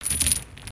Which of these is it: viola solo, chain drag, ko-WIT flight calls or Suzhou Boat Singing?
chain drag